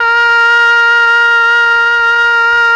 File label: OBOE; ILIO; Propeller Island Legendary M400